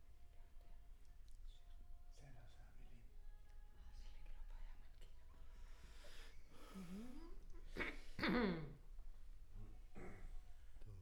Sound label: Center u47, Choir